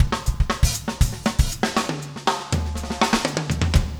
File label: funk 120 bpm, loops